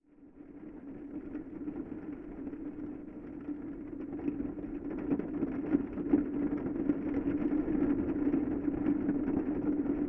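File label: Geofon, Rain Strong, Car, Interior; underground sounds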